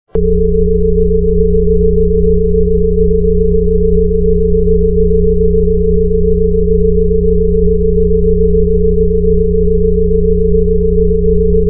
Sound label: 417 hz clear negative energy, Solfeggio tone; Certain tones and frequencies have been used to balance and bring harmony to our lives.